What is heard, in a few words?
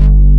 LOW FATTY B, DANCE; Pro-Rec - Synthsonic; Z1 DANCE